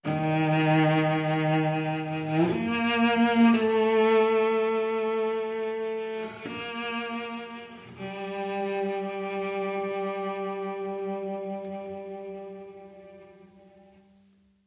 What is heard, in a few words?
Cello Intimate; 15sec